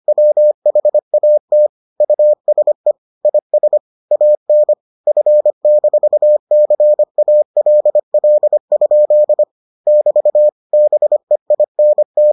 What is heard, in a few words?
morse